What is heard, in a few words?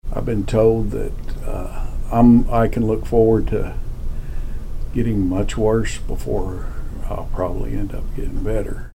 organ; “I’ve been told that I can look forward to getting much worse before I’ll probably end up getting better,”